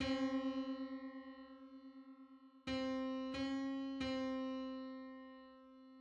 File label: Five-hundred-twenty-ninth harmonic on C